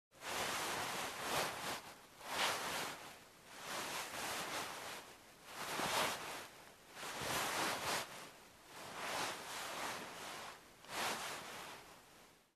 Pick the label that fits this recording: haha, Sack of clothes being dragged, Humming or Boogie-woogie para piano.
Sack of clothes being dragged